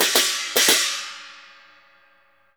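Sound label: CHINA LG15 L, China cymbal; Spectrasonics; Heart of Asia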